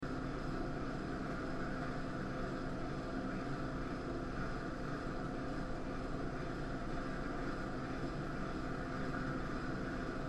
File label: Public Restroom Air Conditioner Room Tone; Loop; Bathroom; Ambience